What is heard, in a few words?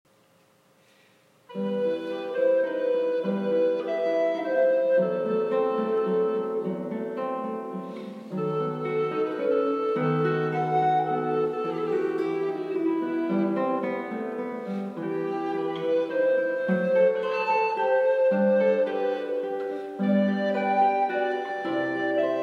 Siciliano